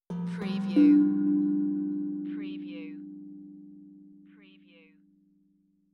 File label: Tongue Drum Meditative Tone